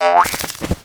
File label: tower attack ready